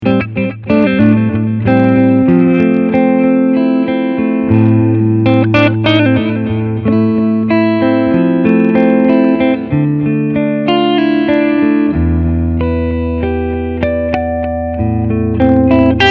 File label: Reaction Slapback Echo